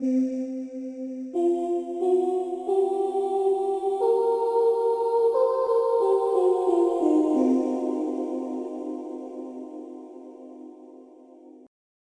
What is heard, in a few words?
MELODY; pblue